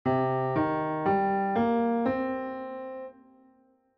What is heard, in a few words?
chromatic misspelled